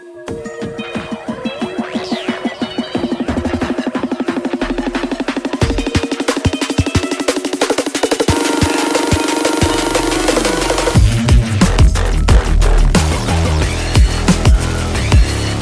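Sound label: background music ringtone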